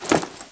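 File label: weapon hud on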